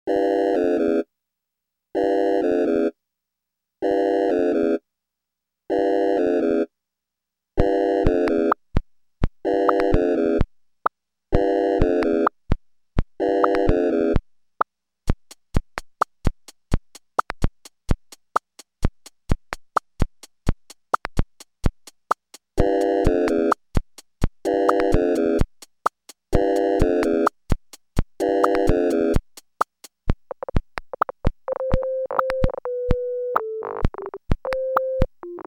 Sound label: Camera; Pikmin 2